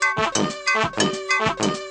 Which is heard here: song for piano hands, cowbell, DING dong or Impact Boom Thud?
DING dong